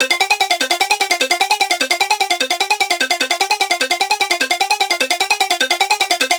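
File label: synth loop hectic plucks 150 F minor; Virtual Riot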